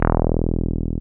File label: 303 Basic E2; Drums In Your FACE!